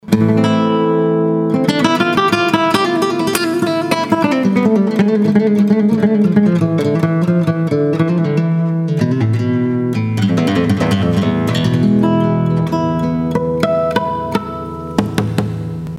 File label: classical